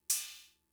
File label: Cymbal; Fricke MFB-522